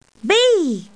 buzz

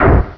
Hit

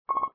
SE add score; tobby ura ura

